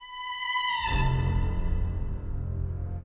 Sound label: strings B to F 90